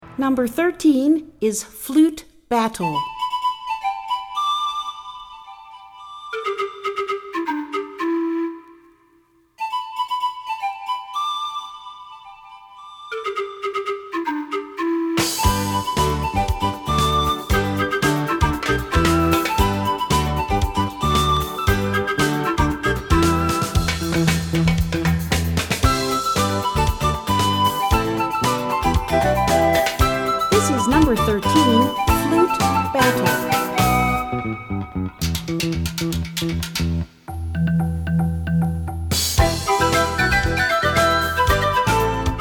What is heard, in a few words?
flute battle